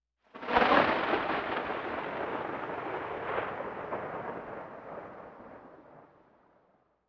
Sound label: close, nature; close2; Atmospherics